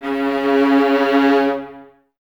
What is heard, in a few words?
String Ensembles, Violas; C#; Miroslav Vitous; LINKS ORCH